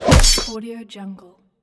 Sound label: sword impact